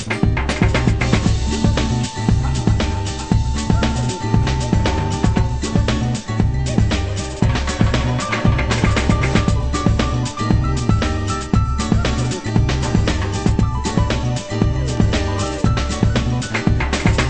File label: funky